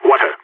water

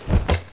push; snowwars

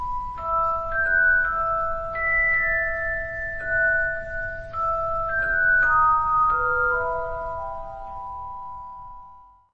song, ambience

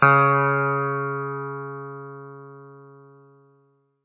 chime

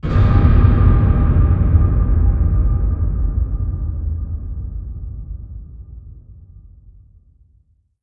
amb boomer

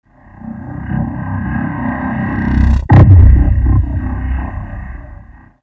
shockwave

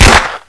mac10, weapons

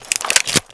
mag7 draw; BalasToxicas

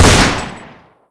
usp unsil

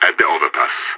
overpass; IDF

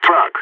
truck

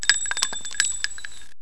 pl shell, weapons

